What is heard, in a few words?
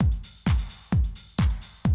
130, Classic DrumLoops